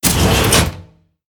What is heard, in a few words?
Hut Door Open; moul